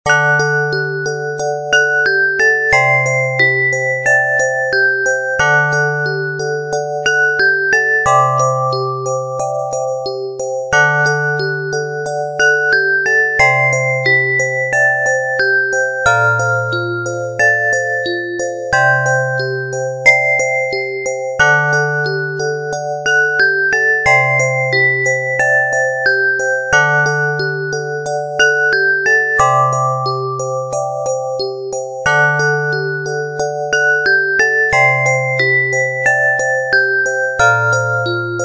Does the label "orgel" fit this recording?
Yes